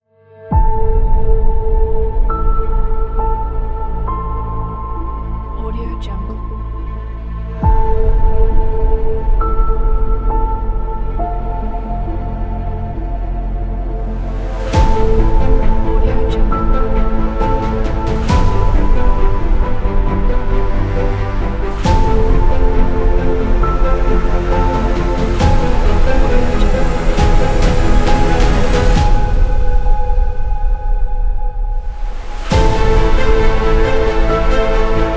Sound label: the cinematic trailer